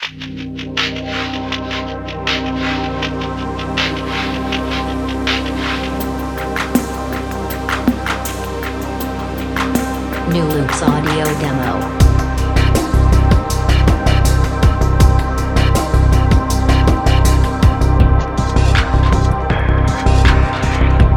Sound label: ambient drum loops